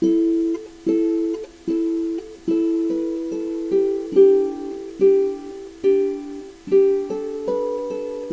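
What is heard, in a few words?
lo fi noisy plucked melody, 115 bpm; SAMPLED MUSIC LOOPS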